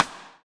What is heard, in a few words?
DR RIM3S; Best Service Gigapack 2